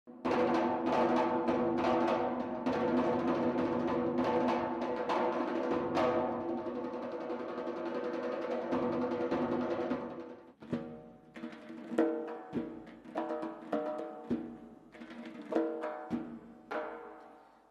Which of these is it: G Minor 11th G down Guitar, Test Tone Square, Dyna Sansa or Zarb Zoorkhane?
Zarb Zoorkhane